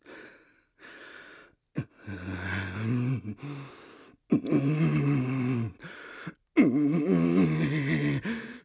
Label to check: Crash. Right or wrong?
Wrong